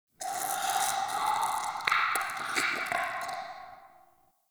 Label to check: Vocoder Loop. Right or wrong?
Wrong